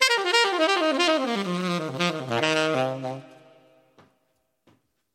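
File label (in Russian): быстрая мелодия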